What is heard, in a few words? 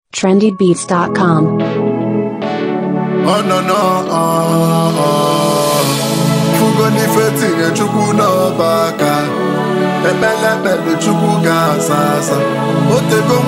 Acoustic Version